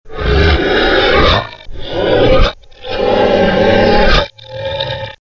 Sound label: danger growl